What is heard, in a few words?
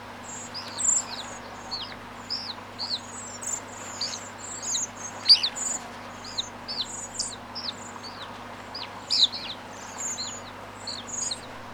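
Eurasian skylark arvensis flight song, common blackbird merula flight call, redwing iliacus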